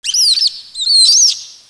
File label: rat pain